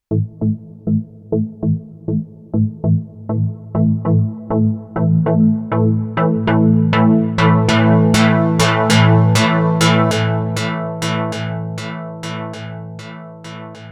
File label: metallic lead; novation peak test